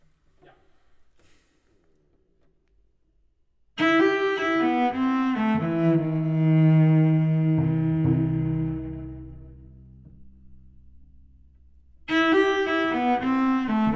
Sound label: Cello intro